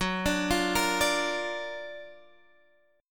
F sharp Augmented Major 7th; x,21,24,22,22,21,x,x

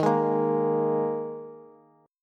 Eb Suspended 4th Eb Bass; Ebsus4 strummed; JGuitar

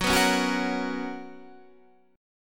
B Major 7th Suspended 2nd F sharp

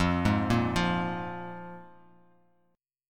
F Minor Double Flat 5th, F, Bass Standard; Fmbb5 arpeggiated; JGuitar

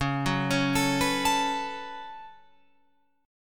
Db 7th Suspended 4th Sharp 5th Db Guitar Open F; arpeggiated

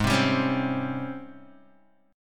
G sharp Major 11th G sharp, Guitar DADGAD

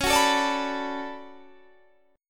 D Major 13th Db, chord

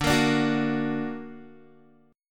D Augmented, D, down, Guitar; strummed; 4E,4A,5D,5G,5C,6F; chord

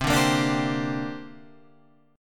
C 7th Suspended 2nd

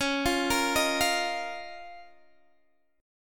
Db 6th Add 9th, Guitar, Open D

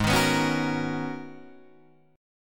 G sharp Minor 11th G sharp Guitar Drop D, chord

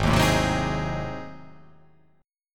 G sharp 7th Flat 9th G sharp down, chord

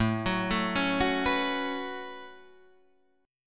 A 6th Add 9th A down Guitar Open D

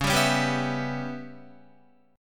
Db Minor 7th Flat 5th Db down Guitar; strummed; JGuitar

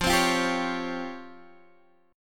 C sharp Major Sharp 11th F

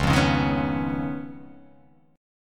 C sharp 9th C sharp down, chord

